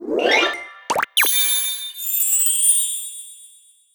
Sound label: game clear